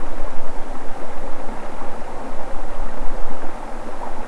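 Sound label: lake, water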